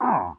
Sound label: pain sharp; Pyro